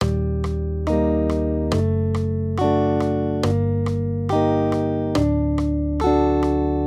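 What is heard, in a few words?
Accompaniment, Major Triads, Eb-D, 140 BPM, guitar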